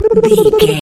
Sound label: voice fun character flutter man